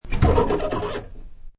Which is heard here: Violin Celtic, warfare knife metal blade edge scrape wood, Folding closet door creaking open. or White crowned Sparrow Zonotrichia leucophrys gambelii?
Folding closet door creaking open.